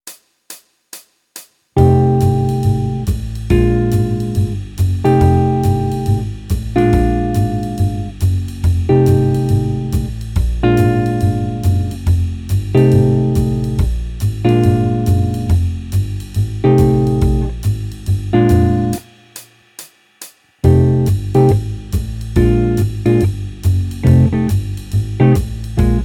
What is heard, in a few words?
Jazz; Guitar Techniques 2019 Issue 296 June